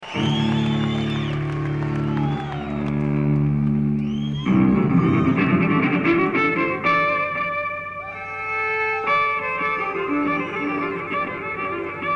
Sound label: Cello Solo, live; Live in Flint